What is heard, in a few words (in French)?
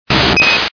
Cri; 0,8 s